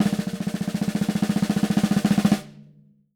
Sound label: SN, SD ROLLS, Living Drums; Sampleheads; Peter Erskine